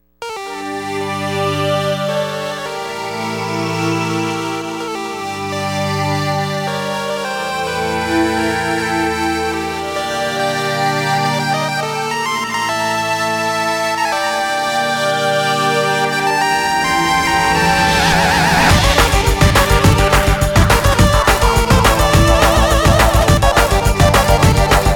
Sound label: 8-bit; DDR Universe